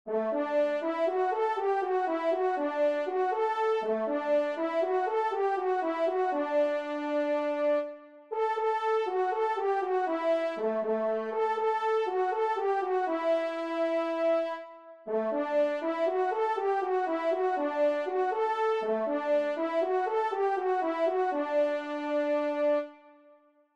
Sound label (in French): trompe; L'Orchere; Chambry; Maine et Loire